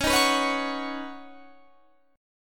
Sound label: C sharp 7th Suspended 2nd Suspended 4th C sharp down Guitar Standard; C#7sus2sus4; strummed; JGuitar